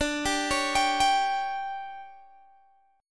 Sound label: D-Major 7th Suspended 4th, D, Guitar, Open G